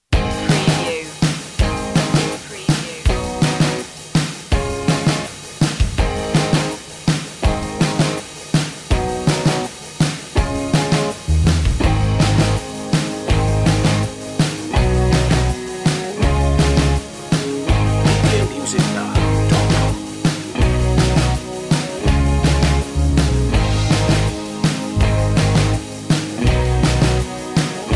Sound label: underscore